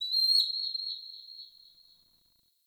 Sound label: Forest Bird High; Ambience; Warframe; Grineer